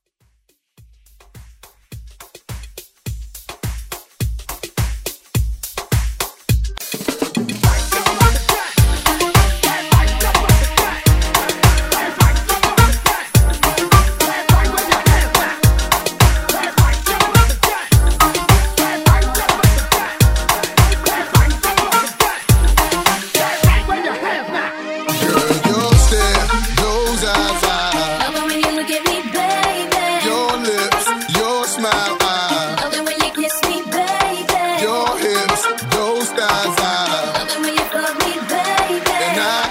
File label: Moombah Edit; remixplanet